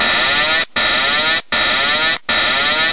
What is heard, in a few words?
APDisconnect; PMDG